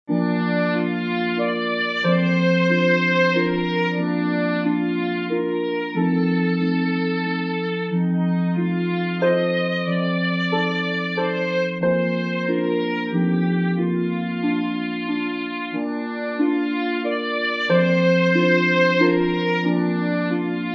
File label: Rock a bye